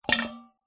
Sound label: household wooden spoon drop into metal bowl water